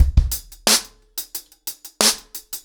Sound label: ACOUSTIC DRUMS, 90 BPM